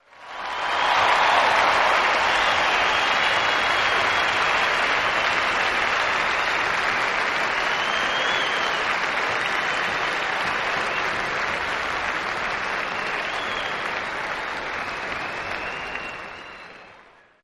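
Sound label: Applause Cheering Whistle, large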